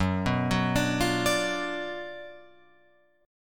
Gb Major 7th Suspended 4th Sharp 5th, Guitar Open F; GbM7sus4#5 arpeggiated; 6,6,6,9,8,9